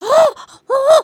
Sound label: fear